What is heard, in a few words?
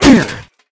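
Soldier Damage; Gamigo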